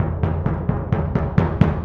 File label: Bass Drum, Orchestral Series Rock and Pop; Sonic Foundry (Sony Creative Software); Orchestral Series VOL-4 - Rock and Pop